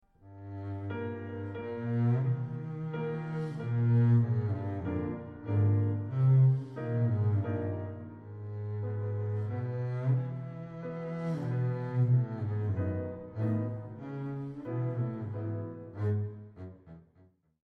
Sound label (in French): l'elephant glisse exemple de legato